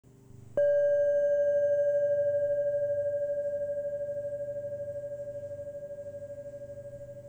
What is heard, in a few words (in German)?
Kopfschale